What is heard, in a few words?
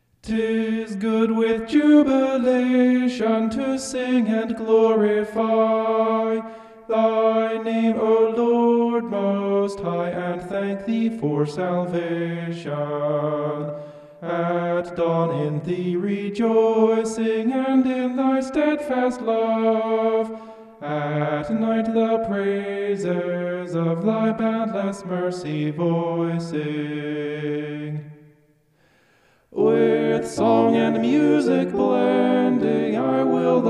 vocal homoph; psalm 92